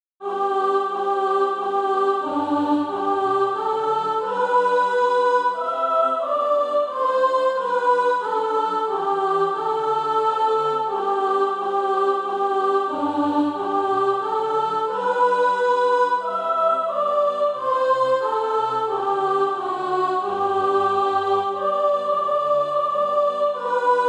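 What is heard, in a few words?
soprano, SATB Practise Music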